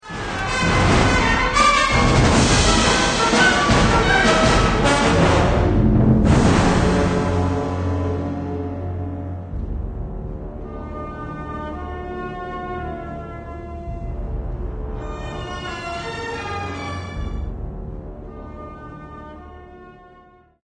cutscene music; Doctor Who Adventure Games Episode 2

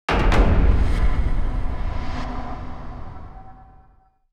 Stinger Transition; skykeepers; ps4 windows xbox one gamerip 2017

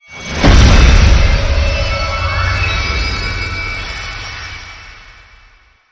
SYSTEM SE PERFECT CLEAR JINGLE; Tales of the Rays

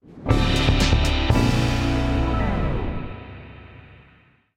Completed; Rocksmith

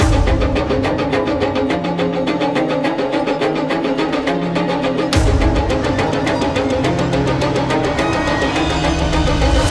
Combat

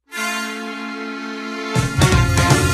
Volcano Intro; Volcano - Part3 Intro

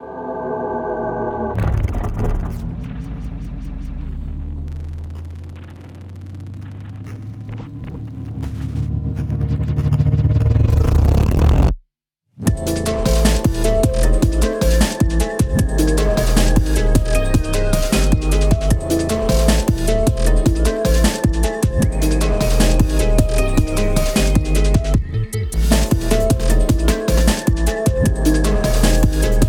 Instrumental; Friday Night Funkin Halloween Mod

